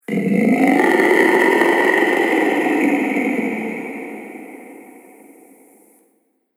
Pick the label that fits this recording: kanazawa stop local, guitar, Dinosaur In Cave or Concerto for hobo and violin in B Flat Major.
Dinosaur In Cave